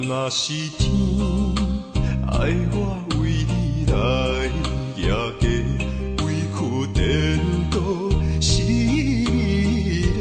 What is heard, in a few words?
Chinese Song